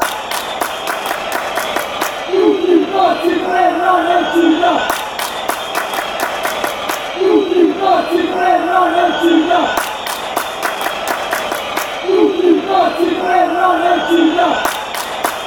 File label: crazy, chants